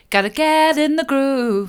mid, vocal

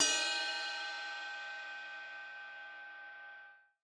Crash, drum

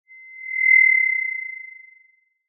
Sound of an owl

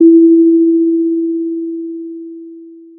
pop, Sound of a doorbell

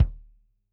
K1 close Kick, drum

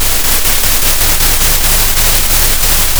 pop, Sound of a thunderstorm

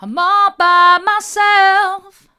mid, vocal

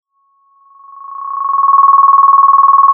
Sound of a phone ringing

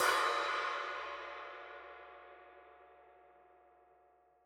Trash C, drum

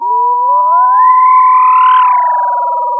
Sound of a police car siren

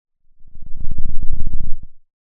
Sound of a lion’s roar

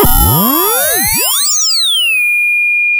pop, sound of a bike bell

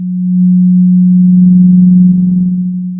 Sound of a cruise ship horn

